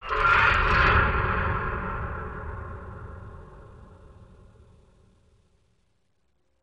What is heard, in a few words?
Creep FX End Lower, horror and atmosphere fx; Silent Hill Downpour